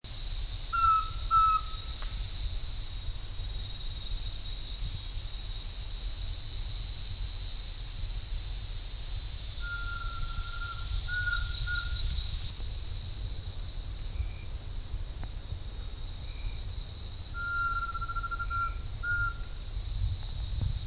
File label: Glaucidium minutissimum; PEC Bot; SP